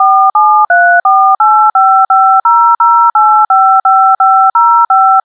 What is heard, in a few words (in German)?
telefon